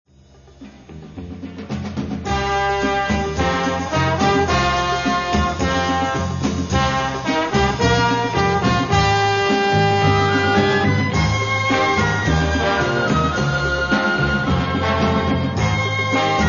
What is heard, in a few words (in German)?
kurdistan melodie; wilder westen heisser orient